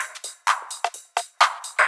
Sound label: Groove 128; Dance Mega Add On Grooves